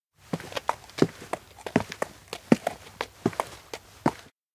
steps outdoor sky walk horse; anemoi

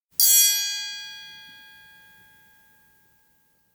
triangle; anemoi

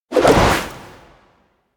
elec grab wind; anemoi

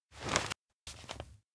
map point; awayuki; anemoi パッケージ版

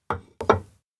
pizza plate; cookoff; spica; anemoi

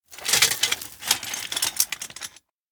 rubble dig; chiharu; anemoi

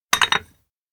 teacup place; anemoi

